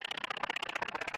Decrease Level; Lethal Company

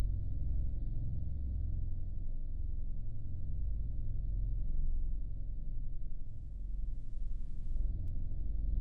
dark ambiance

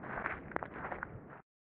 grass

